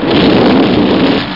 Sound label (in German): feuer